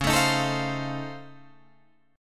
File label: Db Augmented Major 9th, Guitar, Open D